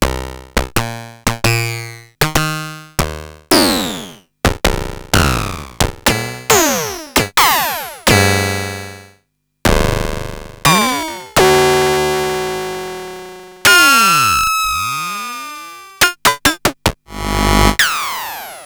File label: Korg Monopoly